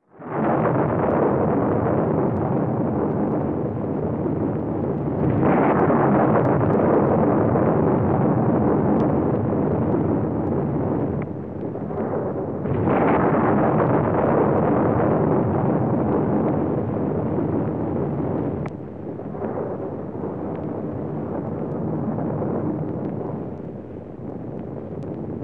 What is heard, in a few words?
Continuous Thunder